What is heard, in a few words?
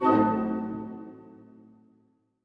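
orchestra; Masterbits - Switch FX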